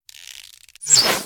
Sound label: bow autoattack cast; abilities